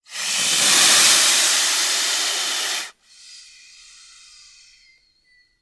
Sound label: Boa Albino Hiss Close, snakes; Boa, Albino Hiss. Close Perspective.